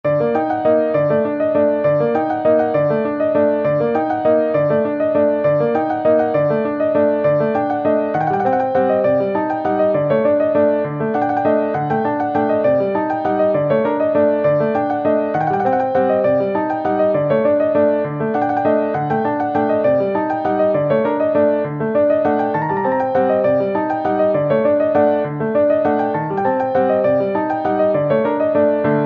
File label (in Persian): شالاکو برای پیانو; رقص شالاکو